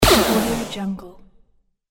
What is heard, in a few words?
space shooter blaster; cactusbear